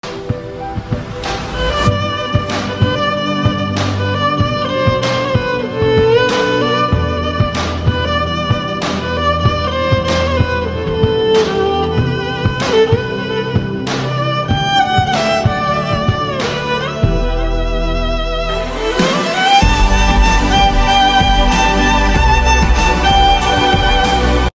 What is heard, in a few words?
violin violin cover; violinista